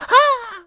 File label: surprised; Scrat